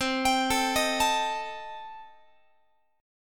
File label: C Minor 6th Guitar, chord; Cm6 arpeggiated; x,20,22,19,19,20